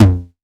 909 TOMS; Wizoo - Platinum 24 Electronic

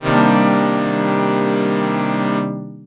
Strings, dx7

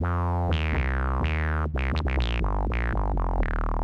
Bass synth squelch C, 125; PowerFX - Nu Jazz House

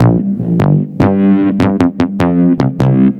Squibble, Bass

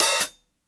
open HH; Basement drums vol 2

